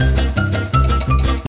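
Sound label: ocean world music cage forcefield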